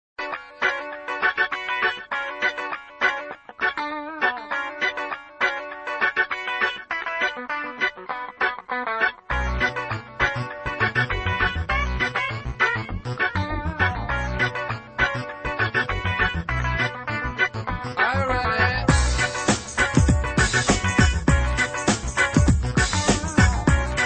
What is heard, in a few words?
funky nomads